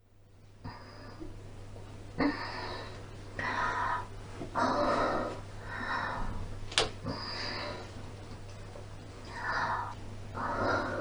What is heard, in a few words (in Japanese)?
オナニーしながら大量に放尿してしまう美少女JKのリアルな喘ぎ声とマンコのクチュクチュ音